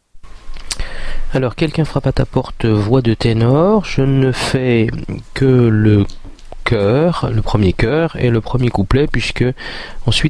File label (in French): frappe tenor